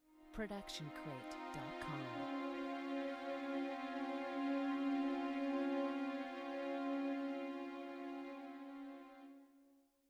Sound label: sad string